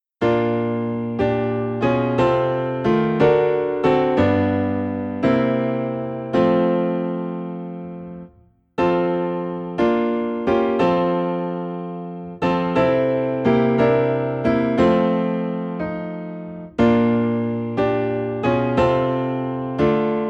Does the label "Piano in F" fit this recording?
Yes